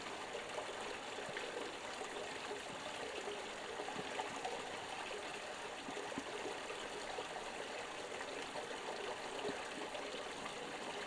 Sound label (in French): Ruisseau oiseaux